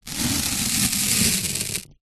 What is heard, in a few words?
band aid plaster strip peel from roll